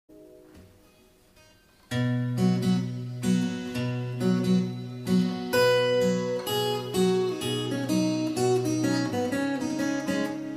fingerstyle

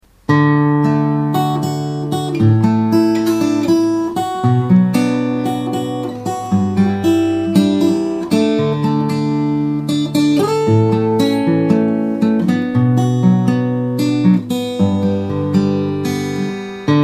fingerstyle, guitar

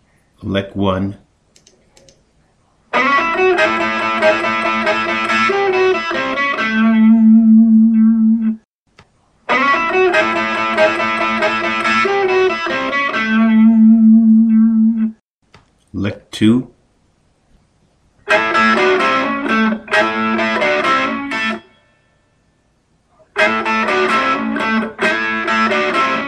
classic guitar licks

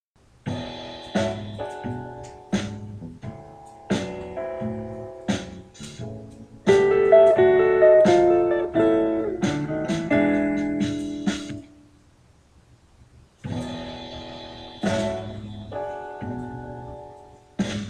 blues turnaround lick

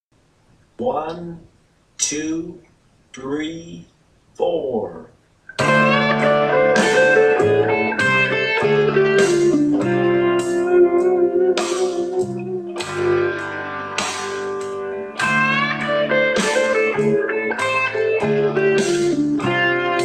d minor lick

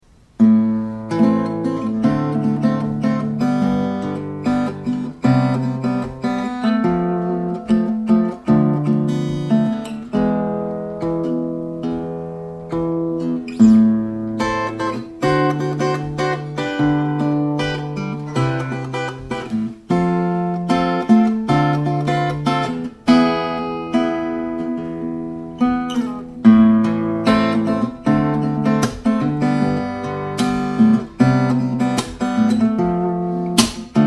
fingerstyle, guitar

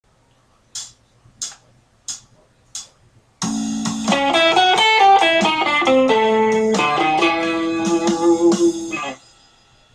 blues turnarounds lick, guitar

